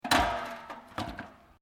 cylinder gas presurized empty on trolley, Home heating gas bottles being replaced; Machinery